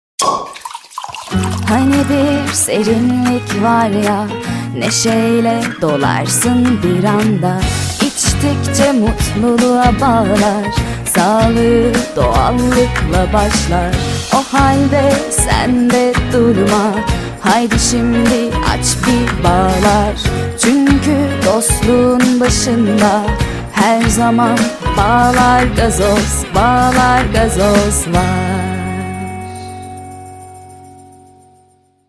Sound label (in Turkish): vokal, jingle reklam